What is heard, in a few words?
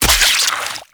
Wyrm Bait Burst; Ambience; Gameplay; Warframe; Infested Eris